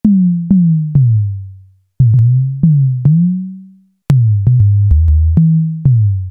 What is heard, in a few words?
Water Drum, Alesis airSynth